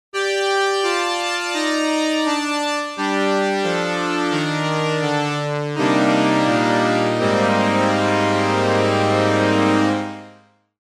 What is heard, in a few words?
omni syn dry; stringer